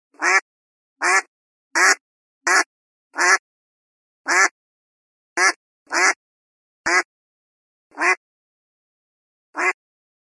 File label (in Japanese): アヒルの鳴き声